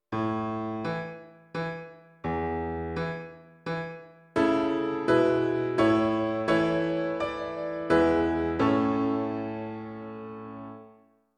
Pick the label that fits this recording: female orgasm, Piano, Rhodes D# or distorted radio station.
Piano